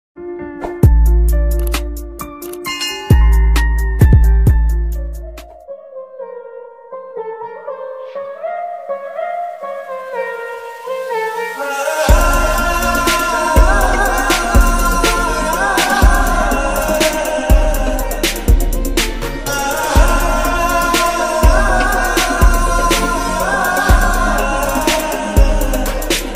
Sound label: remix Tamil love bgm